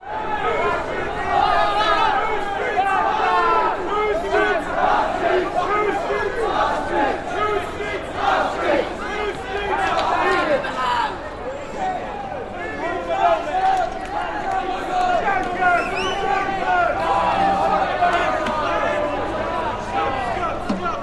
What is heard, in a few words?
Riot Protest Demonstra, angry